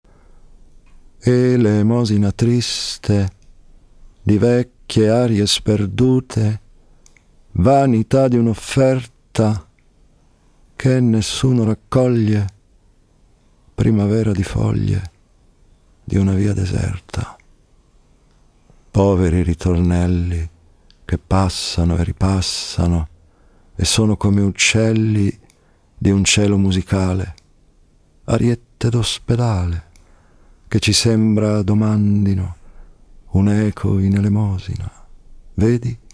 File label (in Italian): organo; corazzini